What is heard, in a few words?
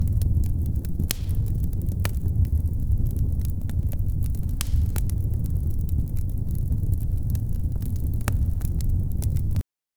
smoldering firewood crack, fireplace